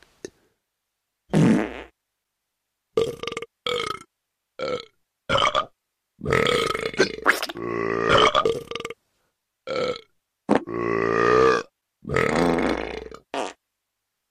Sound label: Human Noises Burps